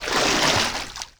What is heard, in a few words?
water run deep